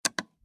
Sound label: timer button